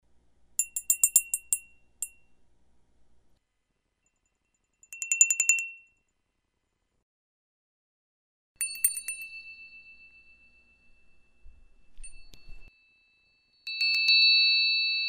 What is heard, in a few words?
bells real synth; theremin